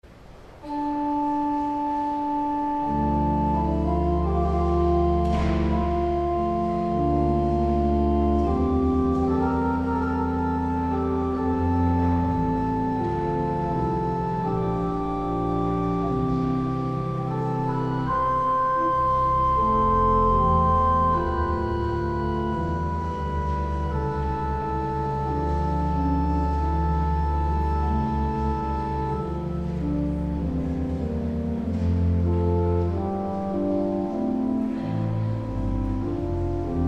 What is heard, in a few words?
Organ, Chorale Prelude; Dietrich Buxtehude; 'When Jesus Went To Jordan's Stream'